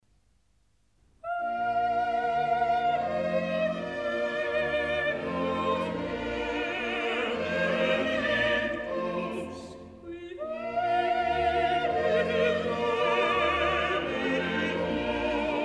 Missa brevis in F Benedictus; Mozart; Missae and Requiem; Davis, Gardiner

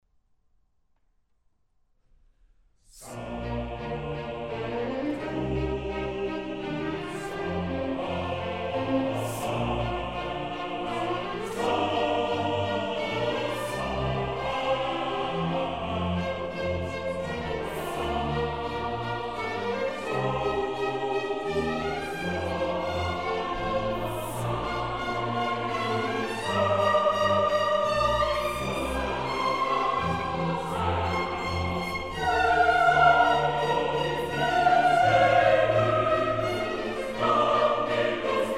Missa brevis in Bb Sanctus; Mozart; Missae and Requiem; Davis, Gardiner

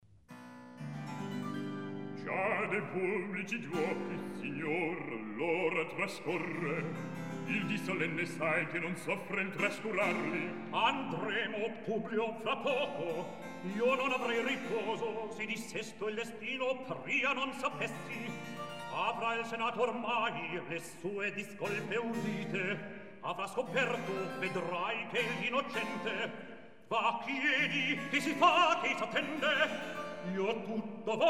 Recitativo; Già De' Pubblici Giuochi; Mozart; La Clemenza di Tito

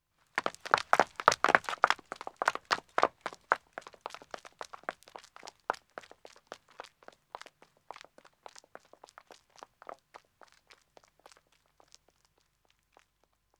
Country Road Running 2 women, Footsteps; The BBC Sound Effects Library

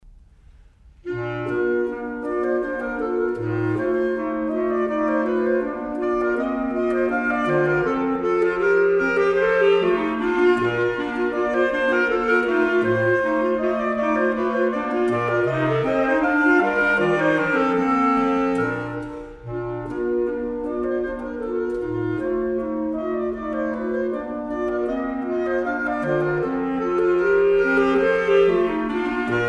Divertimento in Bb, Polonaise; Mozart; Serenades and Divertimenti for Wind

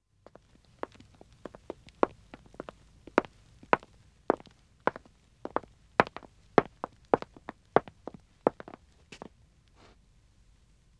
Wood (Dead Acc) Walking 2 men, Footsteps; The BBC Sound Effects Library

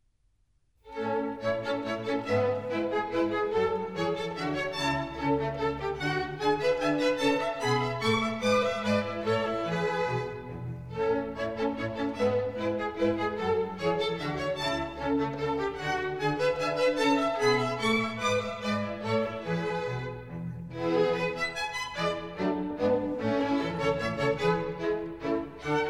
Minuets, A Major; Mozart; Dances and Marches

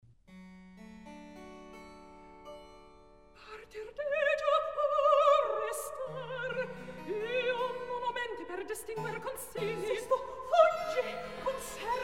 Recitativo; Partir Deggio O Restar; Mozart; La Clemenza di Tito

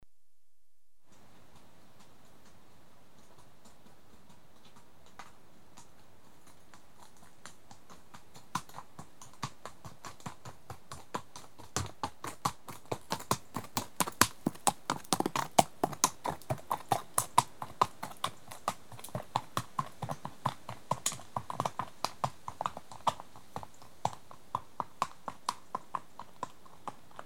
Horses; BBC Sound Effects Library

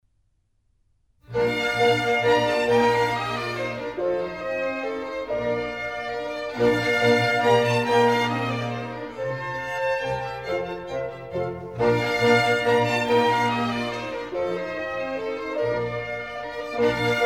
German Dances, Bb major; Mozart; Dances and Marches

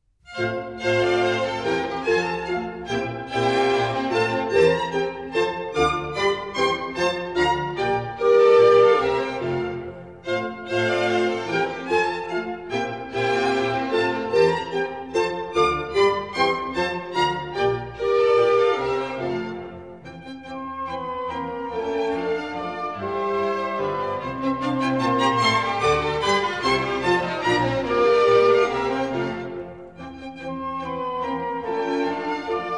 German Dances, F Major; Mozart; Dances and Marches

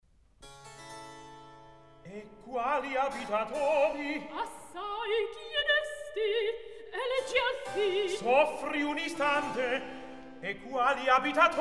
Recitativo; E quali abitatori; Mozart; Il Sogno di Scipione

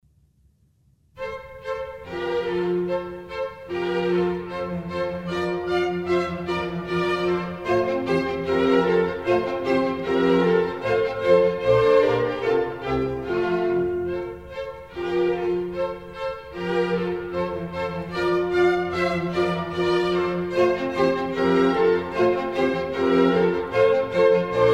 Contredanses, F Major; Mozart; Dances and Marches

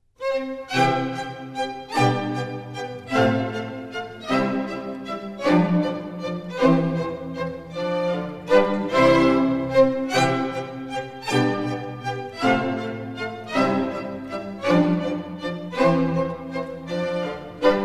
Minuets, C major; Mozart; Dances and Marches

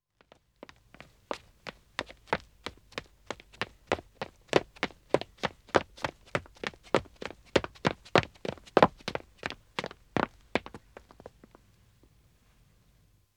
Wood (Dead Acc) Running 2 men, Footsteps; The BBC Sound Effects Library

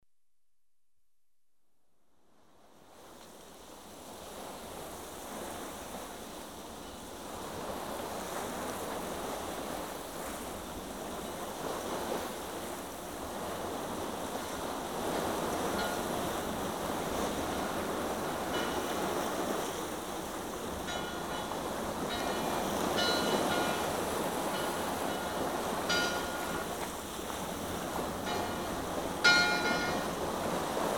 sailing past bell buoy; The BBC Sound Effects Library

